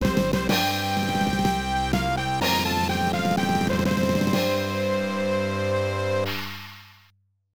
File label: Emperor, Defeat! (All Clear)